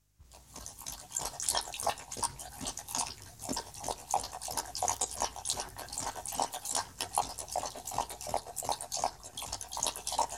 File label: Dog Drinking Water